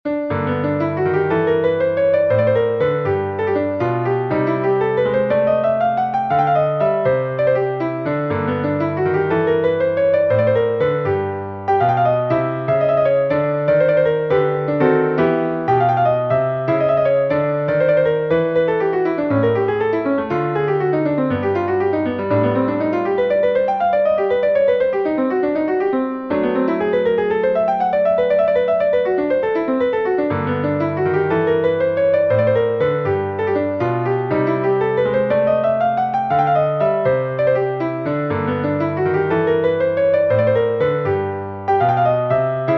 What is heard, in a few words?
Jazz Exercise